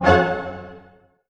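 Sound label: C minor pianissimo, orchestra hits; Sound On Sound - Peter Siedleczek's Orchestra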